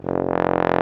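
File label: brass baritone; Roland - LA Composer vol. 1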